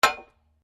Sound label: SLEDGEHAMMER METAL IMPACT HEAVY; Working with a sledgehammer; Hammers; Tools